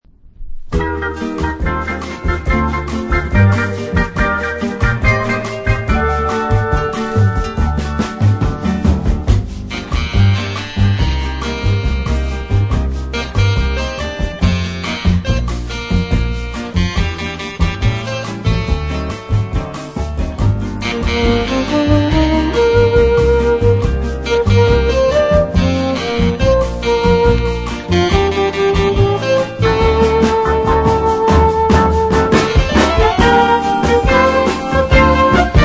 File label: bossa